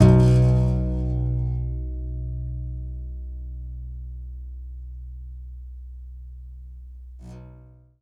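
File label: contrabass balalaika, Plucked Strings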